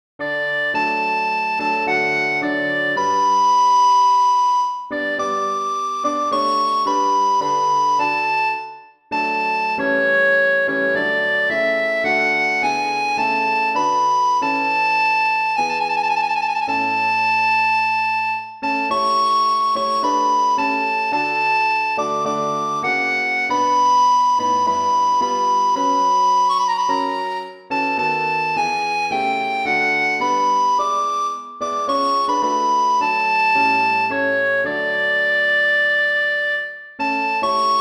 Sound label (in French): hymne national danemark; kra yer hans ernst